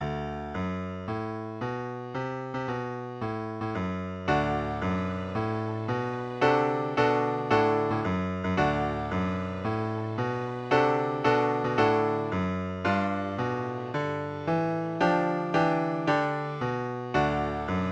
Open One Blues, Strings; Spotlight on Strings

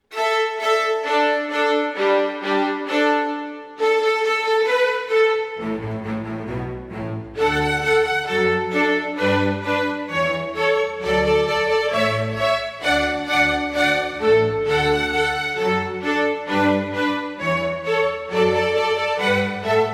Simple Square Dance

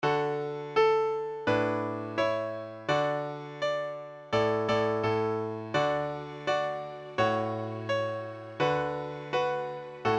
Jingle Bells 84bpm

